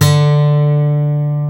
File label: GUI Ac. D3, GUIT AC; Best Service Gigapack 2